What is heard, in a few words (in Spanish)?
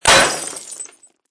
efecto vidrio roto